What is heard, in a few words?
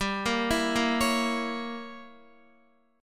G Diminished 7th, Guitar; arpeggiated; x,10,10,9,10,9; 4F,4A,5C,5G,5C,6E; JGuitar